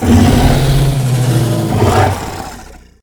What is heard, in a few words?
Chromaggus Death